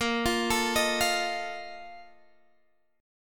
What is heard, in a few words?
A sharp Major 7th Suspended 4th, Guitar Standard; arpeggiated; capo 3; x,13,15,14,16,13